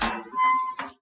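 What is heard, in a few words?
gate open; Mafie 1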